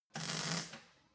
weld sizzle

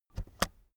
keyboard external space press slow soft

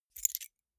key ring small grab

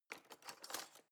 knife wood drawer grab

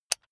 electric button drill direction switch lock heavy

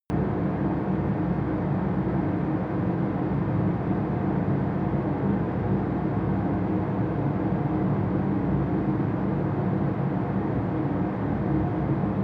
factory room tone fan

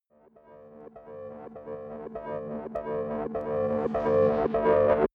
rise stutter

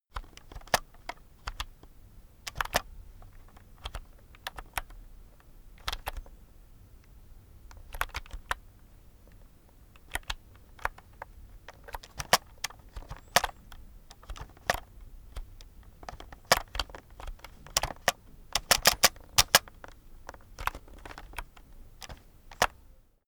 keyboard external game sequence long

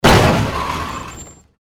cars colliding

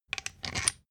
thread screw remove

